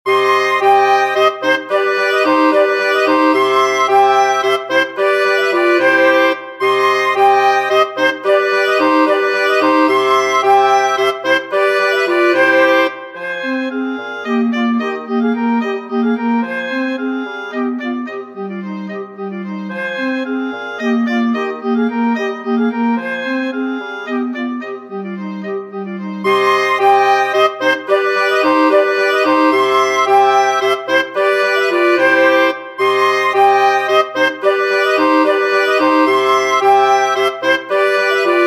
dvorak slavonic, wind quartet

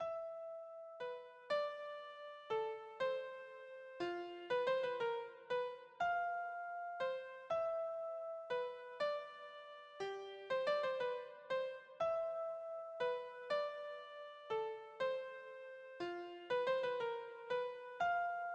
tchaikovsky flower waltz, keyboard

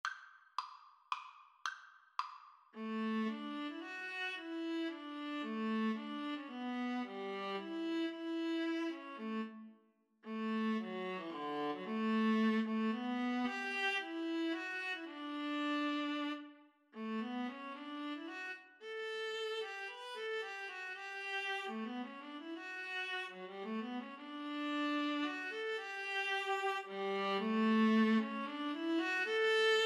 Viola Duet

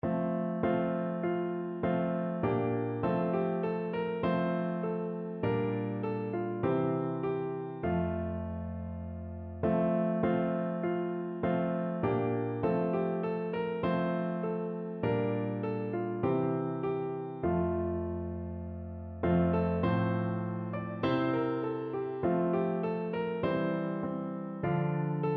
o little town piano

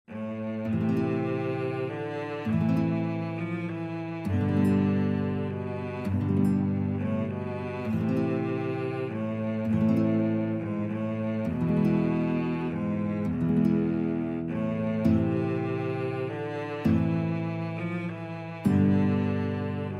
guitar cello duet; greensleeves

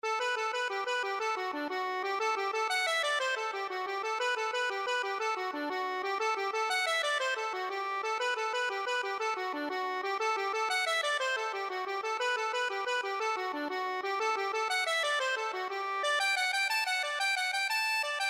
hinchys, accordion

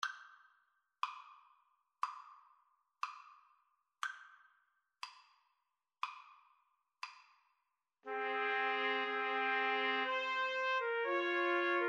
romance 3TPT, Trumpet Trio

